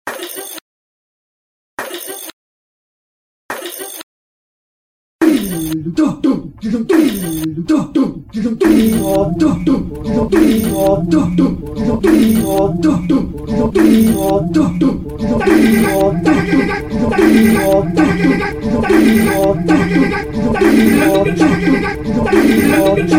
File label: techno